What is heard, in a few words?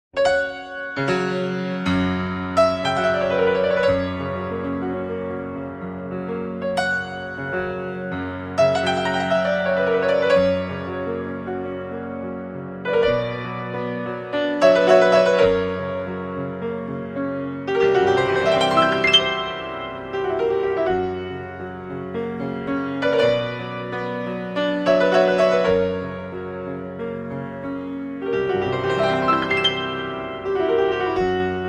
Piano Version